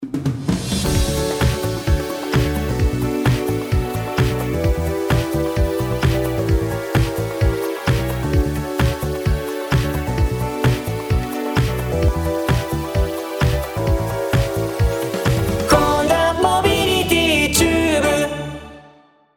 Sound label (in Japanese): ラジオCM20秒BGM